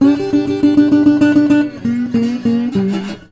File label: 120, Blues Guitar; ILIO - Fretworks